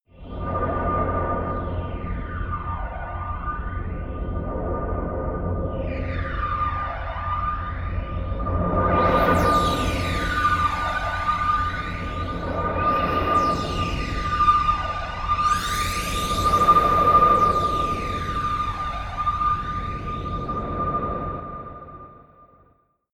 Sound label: meteorite shower